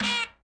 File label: bang whonk sound effect